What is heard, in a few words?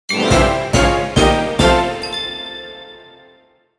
Jingle Highscore; Shin Hyu Stone; 3DS